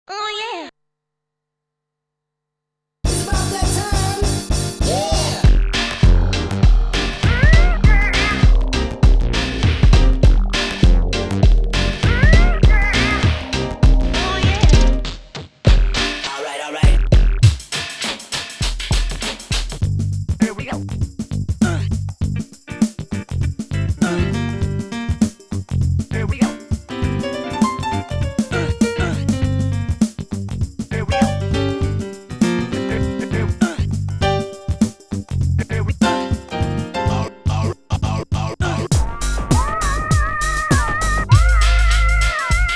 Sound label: yamaha ex5r; factory demo